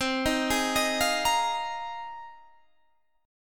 Ab 6th Add 9th C

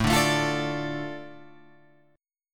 A Suspended 4th Sharp 5th, Guitar Open F chord; Asus4#5 strummed; JGuitar

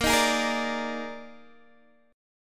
D sharp Major 7th Suspended 4th, A sharp

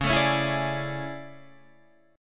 A Major 11th, guitar

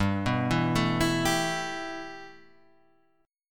G 6th Add 9th, Guitar, Half Step Down

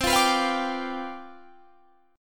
C Major 7th Suspended 2nd Suspended 4th C

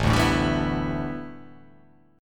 A 9th Suspended 4th, A9sus4 chord; strummed; JGuitar

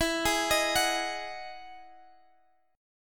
E Major 9th E, Guitar, Drop D; chord shape

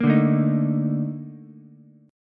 A sharp Major 11th Ukulele, chord; A#M11; strummed; JGuitar

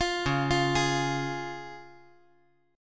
C sharp Major

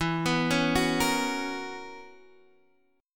B Major 7th Suspended 2nd Suspended 4th E

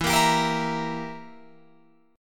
E Diminished down Guitar Open F; Edim strummed; JGuitar

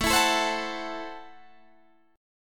Gb Minor 7th Flat 5th, Guitar

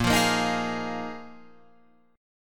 A sharp 7th Suspended 4th down Guitar Open F; A#7sus4; strummed

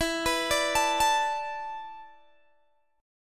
E 7th Suspended 4th, Guitar, Open G

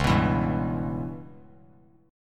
Db Minor 7th Flat 5th Db down Bass Standard; strummed; JGuitar

